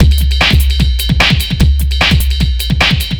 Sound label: Beat, Futurist Drum & Bass; Drums & Percussion